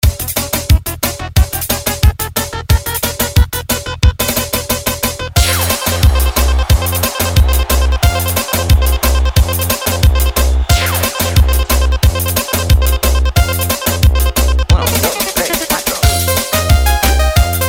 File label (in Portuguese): SOLO IDEAL